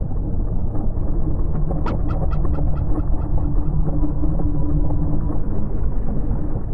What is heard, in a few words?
alt loop, spooky